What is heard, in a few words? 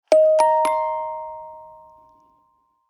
Music Box; Nokia; Nokia Calendar